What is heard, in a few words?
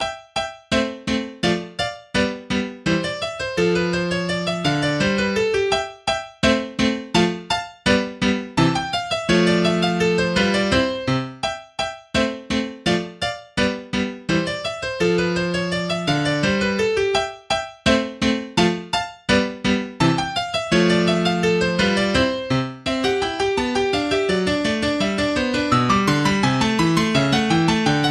piano sonata; Piano sonata No 16 3rd movement